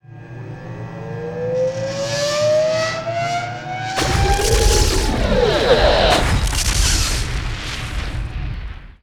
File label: lockup